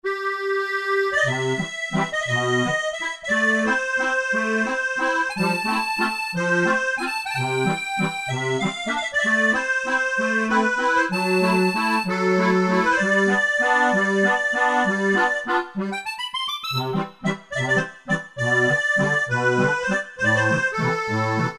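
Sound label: Warm Accordion, Alesis QS8; WrmAcrdion; Factory Preset examples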